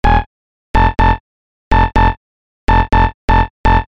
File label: Lead; BRB